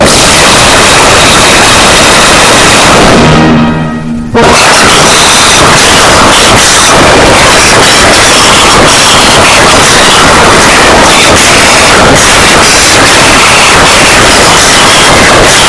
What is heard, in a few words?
flute